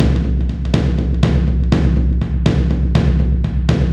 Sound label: 122 BPM Taikos; mmb studio melodic